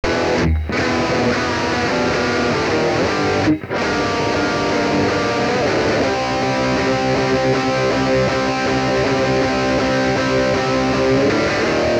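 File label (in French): guitare saturée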